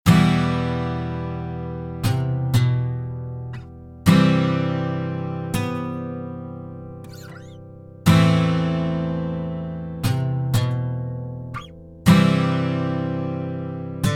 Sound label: Vodka is now good! [Guitar]; Next Day Survival